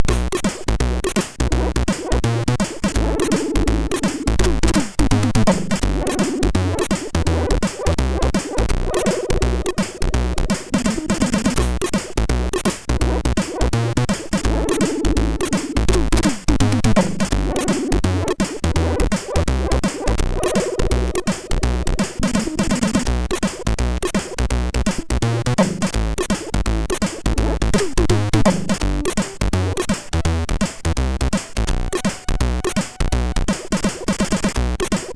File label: Test Tune, sid